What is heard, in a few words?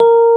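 EP A#3 MP, electric piano; Greytsounds VOL-1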